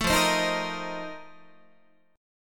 F 11th F, down, Guitar; F11 strummed

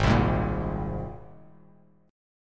G sharp Major Add 9th G sharp, Bass Standard; G#add9 strummed; JGuitar

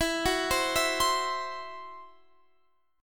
E Suspended 2nd Sharp 5th E, down

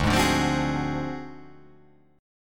D 7th Sharp 9th Flat 5th D down, chord

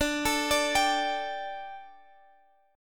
D Suspended 4th D, Guitar, Half Step Down

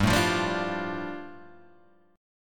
A 7th Suspended 4th Sharp 5th, G

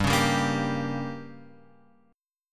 G Diminished; Gdim Chord {3 4 5 3 X 3} strummed

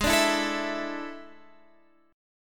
A Major 7th Suspended 4th Ab

